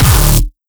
Kick Dist; Boss

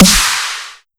Snare Brute

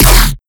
Kick

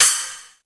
Ride China Short

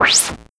Noise Blip

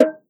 Perc Bongo High; Photosounder

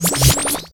Bleep

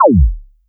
Hi Tech Drum Kick Synth

